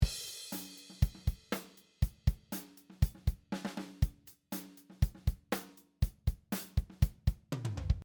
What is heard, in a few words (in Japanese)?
ドラムmix